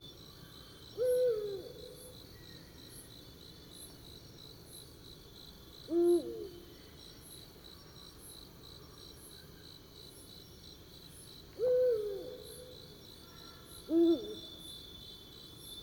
Eurasian Eagle Owl Hooting Duet Of Male Female

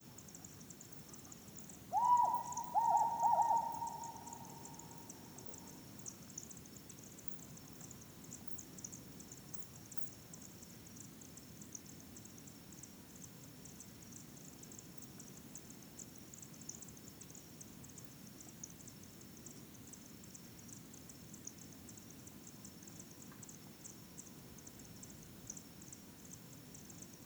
Humes Owl Compound Hooting Of Presumed Female

